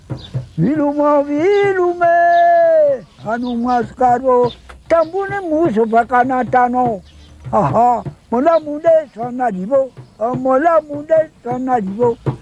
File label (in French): chant malgache